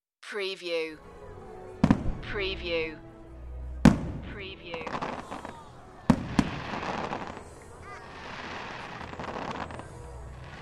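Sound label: Fireworks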